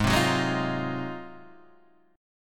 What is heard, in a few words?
Ab 13th Ab Guitar Half Step Down